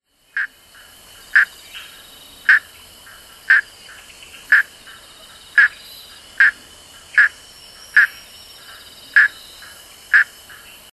Gephyromantis cornutus

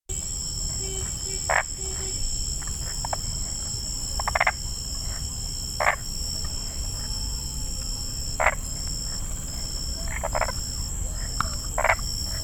Boana crepitans

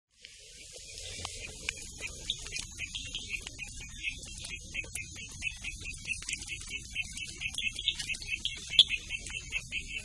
Boophis rhodoscelis

